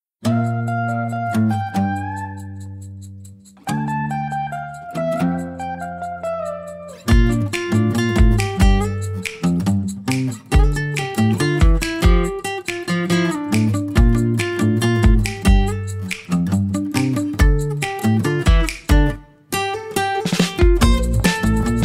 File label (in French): Musique